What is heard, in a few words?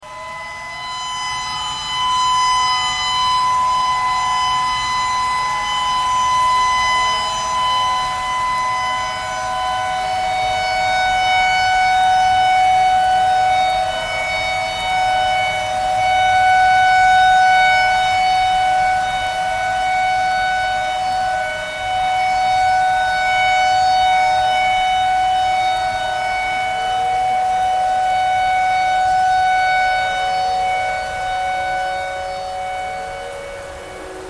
tornado siren tornado siren thread pentatonic